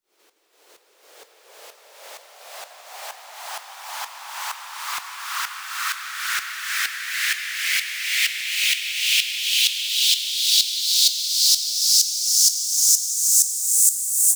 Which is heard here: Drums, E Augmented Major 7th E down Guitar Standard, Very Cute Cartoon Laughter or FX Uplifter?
FX Uplifter